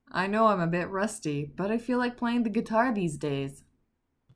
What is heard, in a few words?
rusty guitar